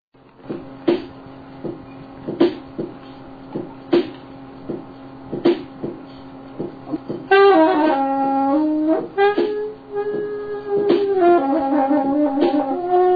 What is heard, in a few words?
trombone